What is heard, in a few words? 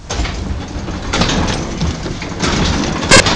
SLIDE